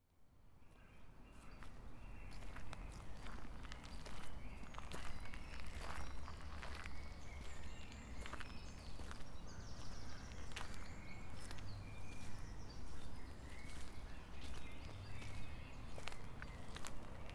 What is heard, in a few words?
ambience